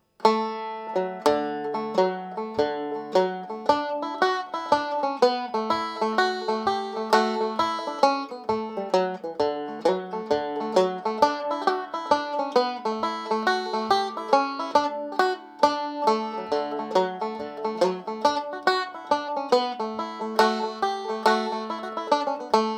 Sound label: Banjo; The Harvest Home